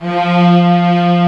Silk Str; Nintendo; sf64

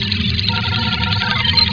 compu noise; Nintendo; Scene SE

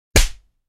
Slap sound; VRChat; Unity Usable Avatars

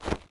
jump, footsteps dirt; jump up; grunt

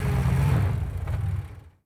new warthog left out; vehicles

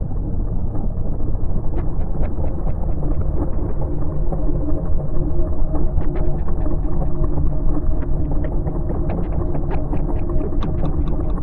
alt loop, spooky

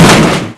crash; Nintendo

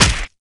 melee hit, big smunch

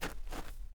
dirt shuffle, footsteps; chief

